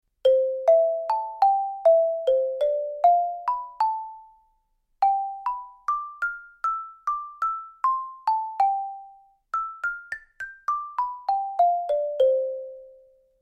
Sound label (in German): SXG 1000 - mit einem Schlägel; studio-49